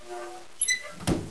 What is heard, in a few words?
Door